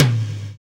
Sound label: TOM, Roland; Club-50 - Foundations Roland; Ext. Snare 4